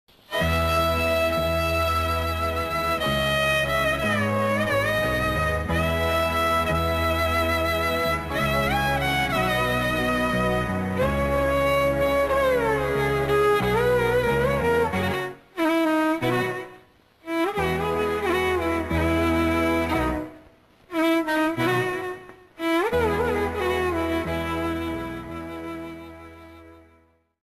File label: sad violin ringtone